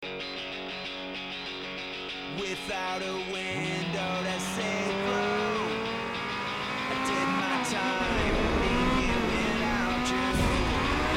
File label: burn; un extrait directement issue d'une course; courses clandestines en pleine ville